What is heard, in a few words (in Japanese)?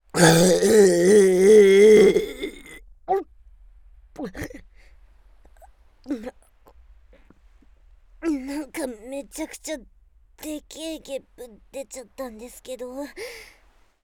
ロングザーメンゲップ1回; ザーメンゲップ集